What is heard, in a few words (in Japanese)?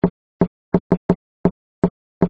スイカ, スイカを叩いた音; 遊外大王の畑産; 若干早めで、甘みより酸味がありました。2のスイカと同時に収穫。; Nature Capture